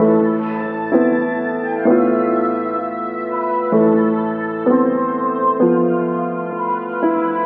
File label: piano longing, sad loop; Loops